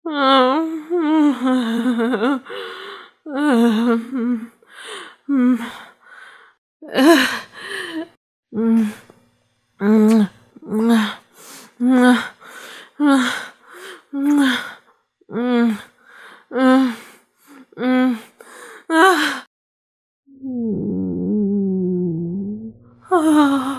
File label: Unused Moans